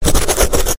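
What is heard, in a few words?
sounds of scratches on metal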